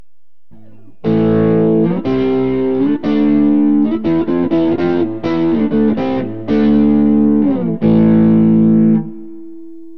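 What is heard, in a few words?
evolution neck, guitar pickup; burny; fugly clips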